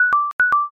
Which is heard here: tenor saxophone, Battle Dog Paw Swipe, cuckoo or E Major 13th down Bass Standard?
cuckoo